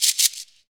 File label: SHAKER, Afro Shakers, Shake&Rattle; Roland LCDP14 Africa VOL-2